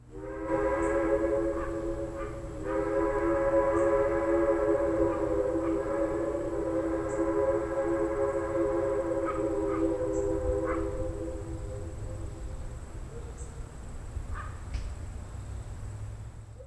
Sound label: Distant Hornage